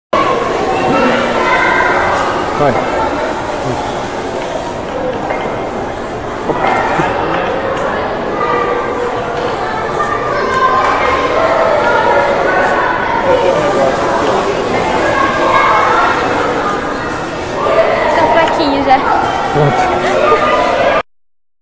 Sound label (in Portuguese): Chispa Trepadeira; Estacao Ciencia exp